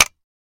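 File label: Mouse Trap Clear Snap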